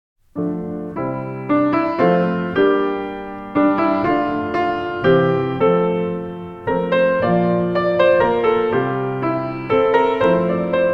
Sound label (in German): Es fuehrt mit Gesang